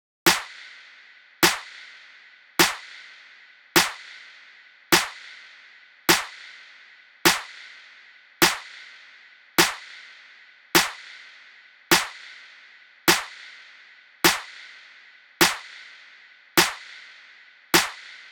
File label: Drum Loop - 103 BPM Clap; Loop Stems; Cymatics; DOPE DRUMS VOL. 1; Street